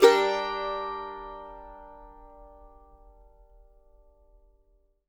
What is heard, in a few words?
mando min; Sampleheads; Whole Lotta Country 1 & 2